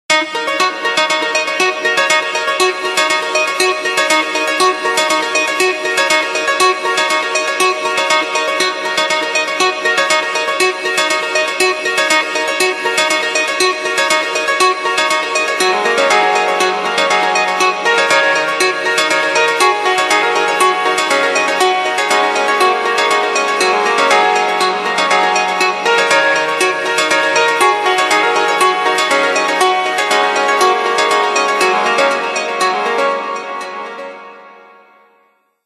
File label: Alarm Bright; HTC T-Mobile Shadow